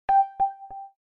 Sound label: Charger Connection; Alcatel OT-991D